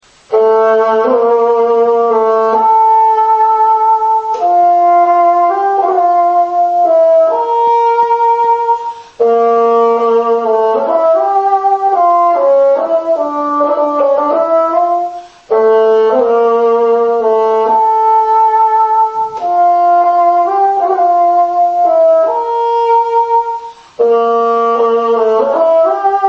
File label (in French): basson; instruments